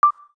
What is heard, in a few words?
Navigation key, key tones; Samsung; GT-C3200 Monte Bar